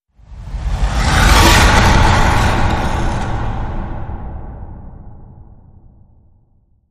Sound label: Skater Carve Transition